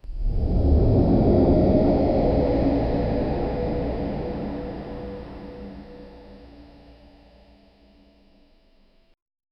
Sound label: wide underground concrete, bunker